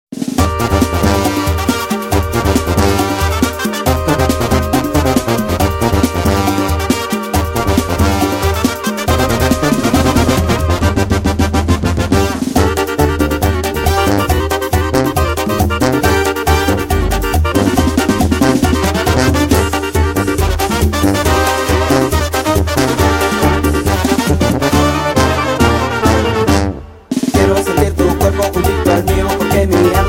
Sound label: Banda; Chicago